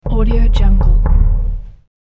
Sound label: door knock